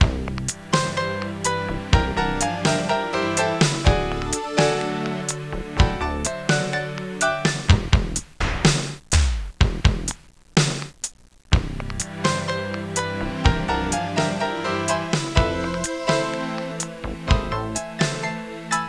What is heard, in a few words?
Tango